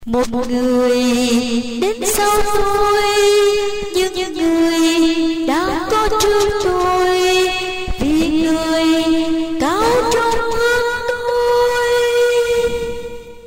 solo

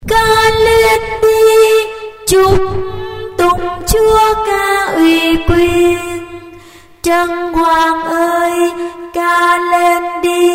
alto

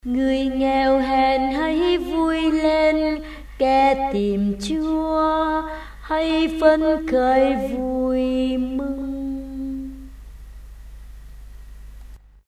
alto basso

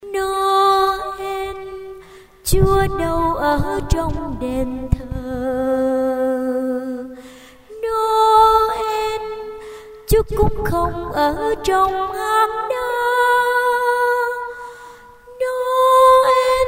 alto

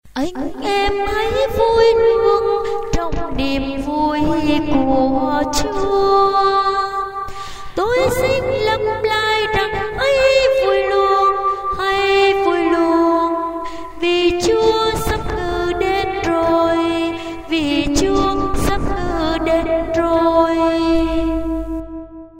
alto

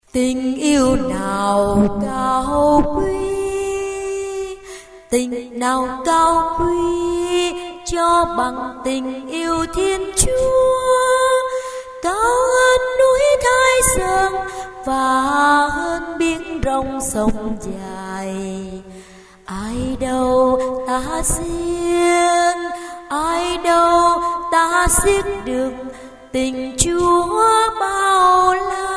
basso

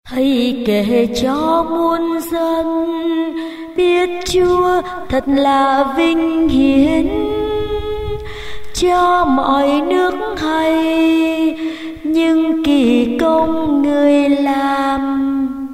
alto basso

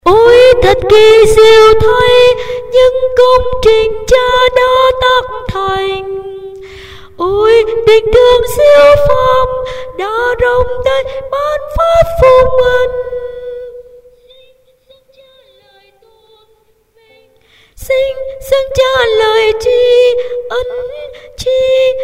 tenor

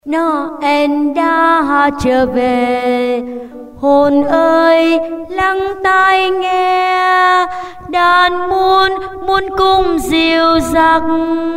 alto

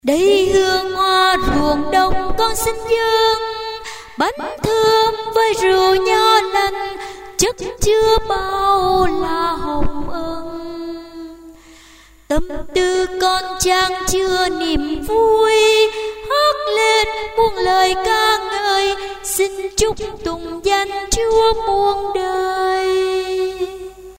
alto

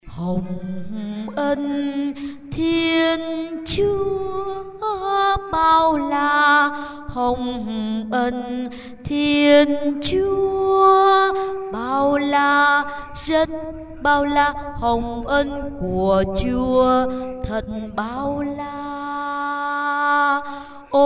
basso

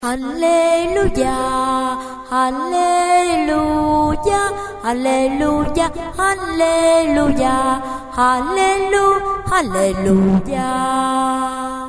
basso

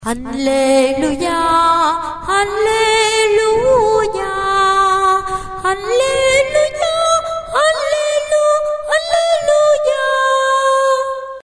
soprano

